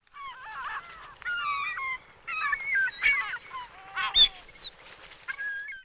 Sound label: gulls; acqua